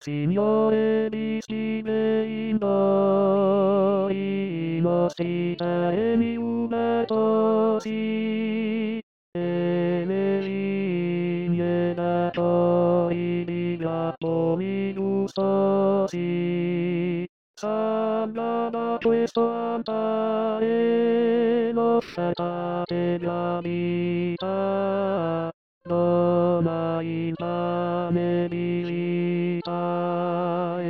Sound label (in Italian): Nel Nome Di Cristo Uniti Tenori